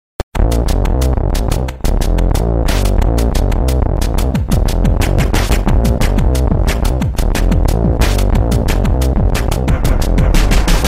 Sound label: Battle Bass; Zero Gear